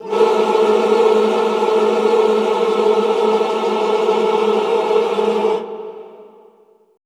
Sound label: classical choir, cluster; Best Service; Extended Classical Choir